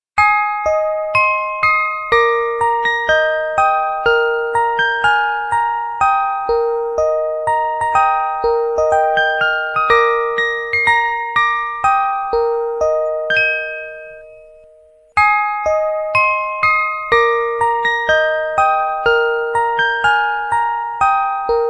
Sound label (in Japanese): オルゴール